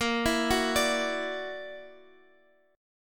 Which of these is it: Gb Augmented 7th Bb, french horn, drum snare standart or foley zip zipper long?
Gb Augmented 7th Bb